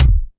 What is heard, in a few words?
electro kick; Boy In A Band Electro Kit